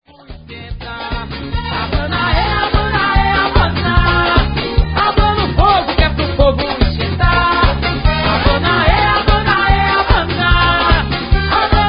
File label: vocal, chanteuses